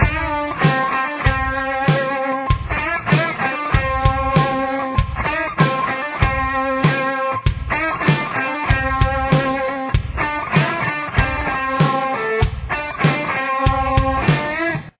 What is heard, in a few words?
lead, guitar